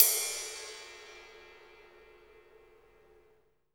CYM Ride; Best Service Gigapack 2

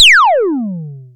SPACE DRUM

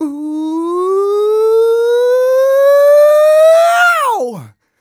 128 uh e-flat, mal, Vocals

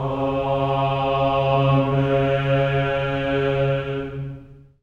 amen; Spectrasonics; Symphony of Voices; GR AMENS

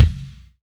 Kick, Live Kit; Sonic Foundry (Sony Creative Software); Ugly Remnants VOL-2; Single Shots; Beatstuff

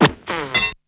VOX; Zero G; Man Machine

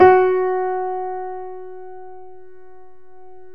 PIA GRND, Roland

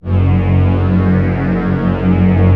Prophet Five; E-MU Formula 4000 Series Vol. 3 – Analog Odyssey

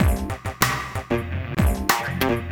Orbish Funk, Synchro Funk, Beats

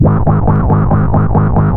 EMPTY BASS; Big Beat Samples & More

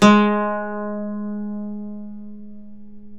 OUD G#2, Turkish Oud

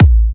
Kicks A; markus hakala

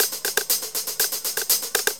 LOOP120; Sounds Good - Eurotech

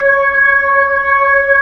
B-3, Jazz

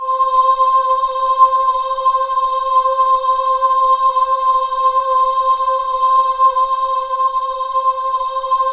C5, Synth Leads

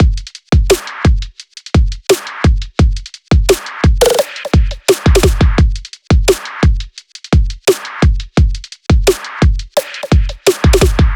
Half Time, Drum Loops, 172; M8; Electronisounds

